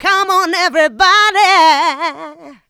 voc, female vox, Vocal House

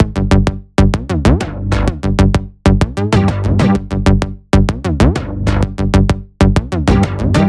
128BPM Melody; Vengeance Samples; VFH1 128BPM Tron Trio Kit

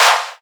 Claps; Vengeance Samples

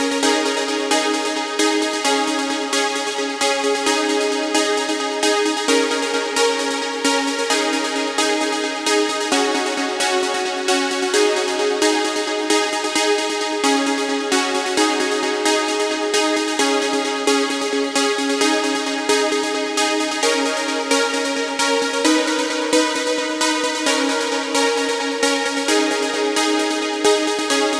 132BPM Mainlead WET, G#major; Vengeance Samples; Jericho Kit; Bassline & Melody

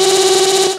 Synths G; vengeance essential club sounds-3

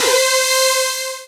Synths C; Vengeance Samples

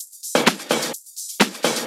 Electro Loop 128 BPM; Vengeance Samples

